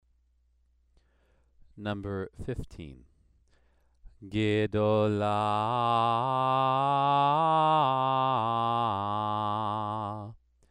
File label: Torah trope exercise